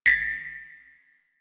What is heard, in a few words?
Focus Boost Count Down; Warframe